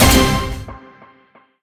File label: roulette, end; wbg games